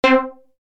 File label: Steeldry